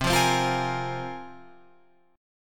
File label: C 7th Suspended 4th Sharp 5th, Guitar, Open F; C7sus4#5 strummed